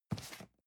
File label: cardboard box medium grab; objekte